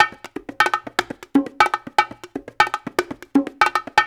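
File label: Busy Drum Whistle, African Contemporary; Spectrasonics; Supreme Beats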